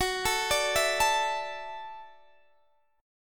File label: F sharp Minor 7th Sharp 5th F sharp, Guitar Open D